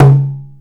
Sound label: BOTTLE THUNK, percussive